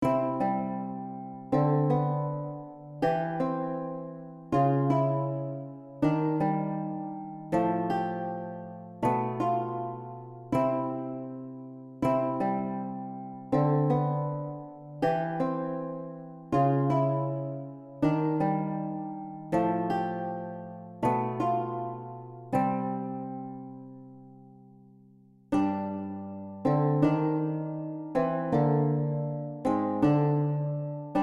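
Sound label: SLOW DANCE, solo lute; easy lute pieces vol 3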